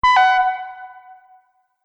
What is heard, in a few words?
Multimedia Event Notification, beep sound effect